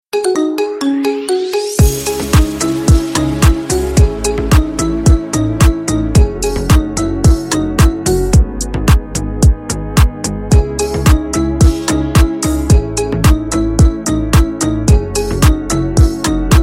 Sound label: rington iphone remix